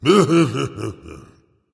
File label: grumbling; smite; voicepack; ymir